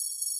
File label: SYST HP C#5; Analogon